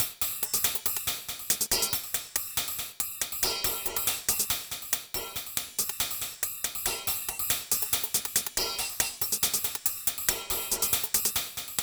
Cymbalz N Hatz Fazt More 140, Percussion Combos; M-Audio; Pro Sessions VOL-38; Abstract World Fusion 2

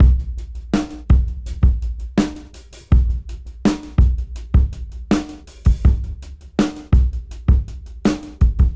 82 BPM; AMG; Keith LeBlanc Phat Butt Jams

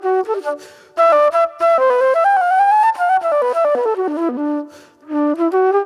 Mellow Soul Flute

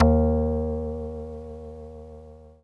BIT PLUK, Vintage Keyboards; Big Fish Audio - First Call Vintage Keyboards

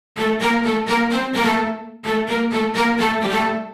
Stacc Str 128 A, uk garage, Synths; 128bpm Lines n Loops

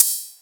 Ride, Rides; Electronisounds

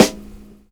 XV Snare, Roland XV-5080

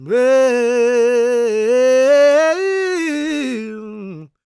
GOSPEL MALE; Spectrasonics Vocal Planet

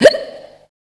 Dance Vocals, FEMALE

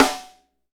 SD SDR, Kit s; Real-Kit s

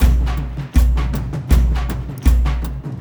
AF TRANCE; Spectrasonics; Heart of Africa 2

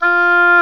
oboe, Orchestral Winds; Oboe f; Roland LCDP04; Oboe 4-8

